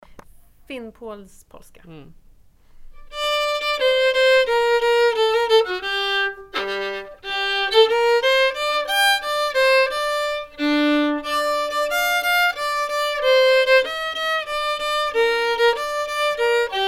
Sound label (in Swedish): polska